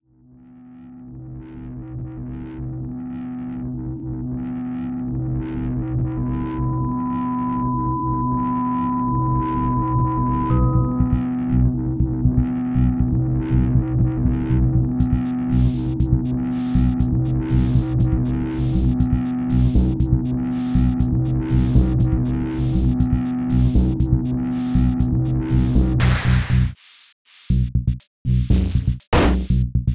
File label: Sinewave